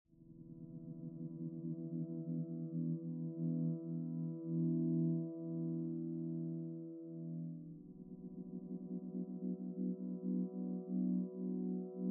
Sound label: XLFO